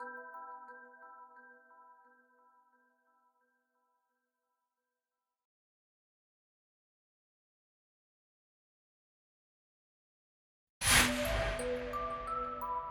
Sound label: Reactor Mix Stem Layer